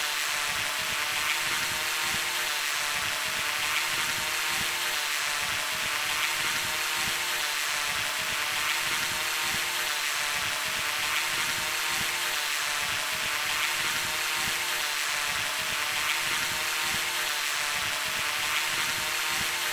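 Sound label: radio control helicopter; mcheli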